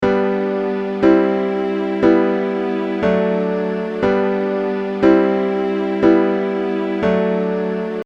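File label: I IV vi V chord progression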